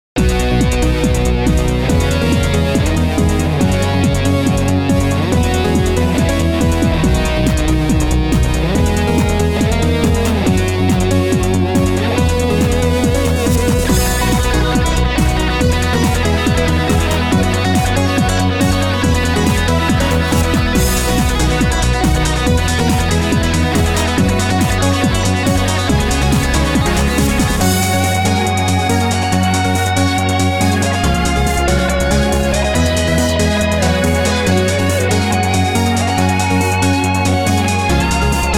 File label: guitar